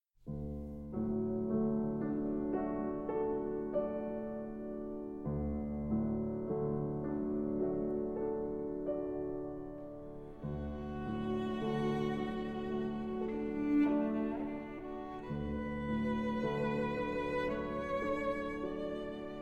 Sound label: Cello & Piano; Thaïs Méditation